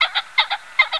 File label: Phasianus colchicus